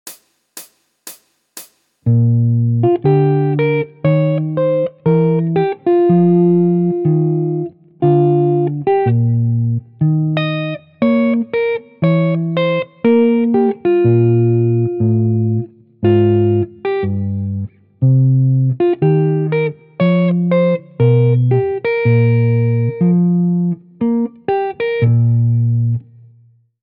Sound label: Jazz Chord Melody, Guitar; Guitar Techniques 2019 Issue 297 July